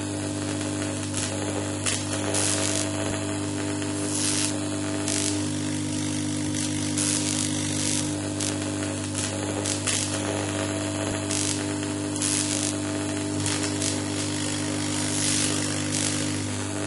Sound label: electric buzz; ambience; AssaultCube